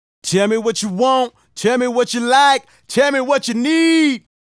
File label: Male Solo, Hip-Hop and RnB Vocals